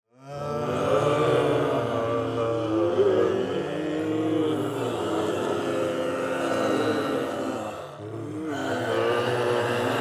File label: zombie crowd